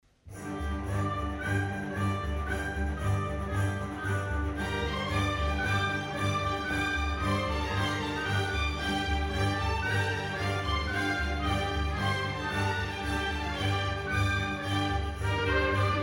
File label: Saltarello Medievial dance, για Ορχήστρα